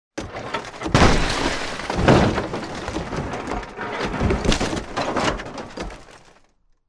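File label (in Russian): отдельный звук переворотов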